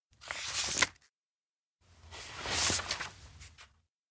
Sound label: oldnote page turn; anemoi